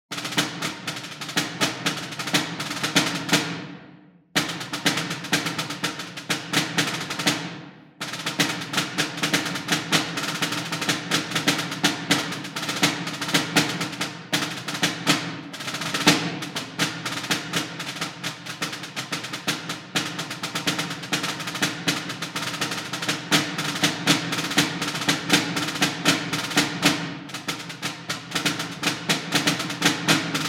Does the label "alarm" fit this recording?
No